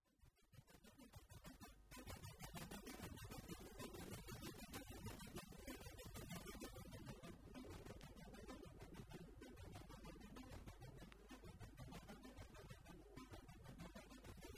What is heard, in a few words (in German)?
Sequenzer